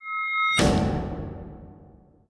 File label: Window Close; KDE; knotify